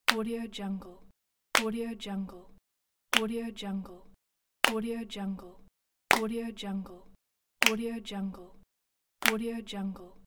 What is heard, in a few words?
gentle hand claps pack